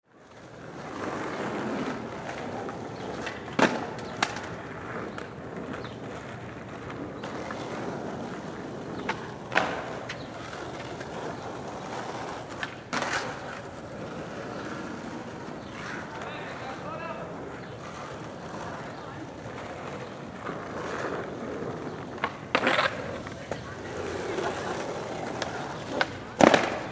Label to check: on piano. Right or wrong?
Wrong